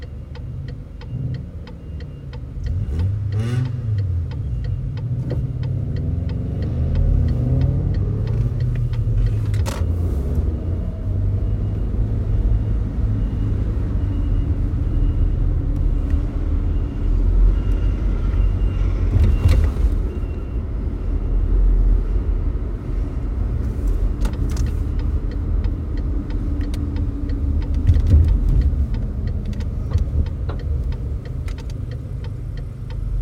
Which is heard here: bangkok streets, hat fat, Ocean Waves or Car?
Car